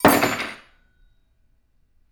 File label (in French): ustensile tombe